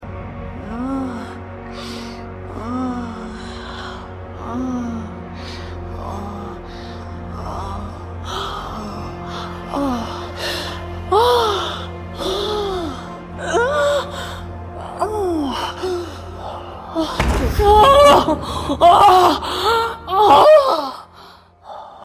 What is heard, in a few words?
Moan, AI